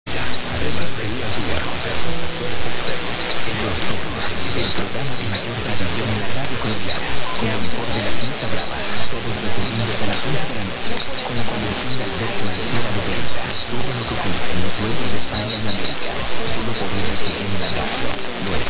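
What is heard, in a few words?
Colombia, radio; HJAJ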